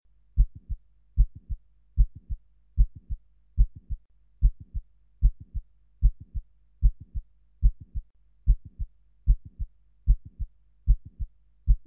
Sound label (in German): Mitralklappenprolaps und Mitralinsuffizienz, Heartbeat